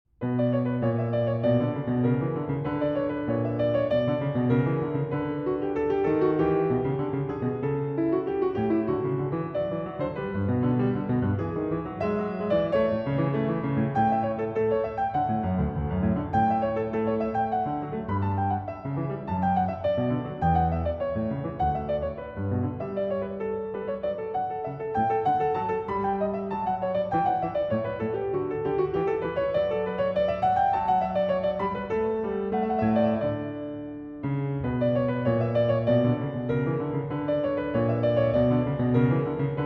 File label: Keyboard Sonata in B Minor, Allegro; Op. 2 No. 5